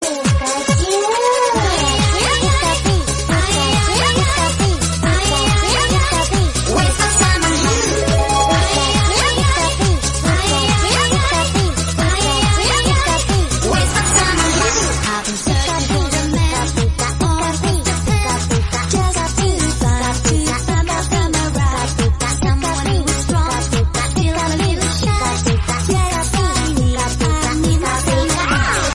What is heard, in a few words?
remix funny call tone ringtone; pikachu